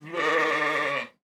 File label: goat serious; battle